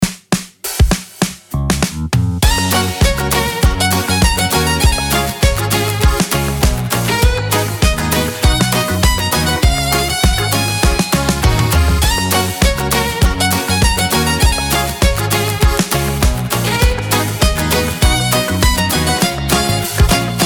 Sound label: violin